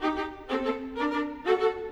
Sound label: Violas, Orchestral; Sonic Foundry (Sony Creative Software) - Orchestral VOL-2